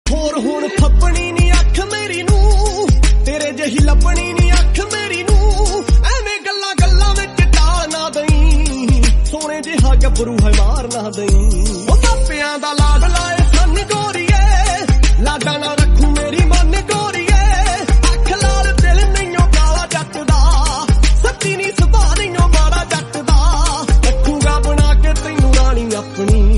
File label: Punjabi